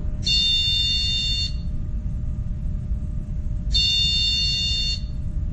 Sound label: Flat Bat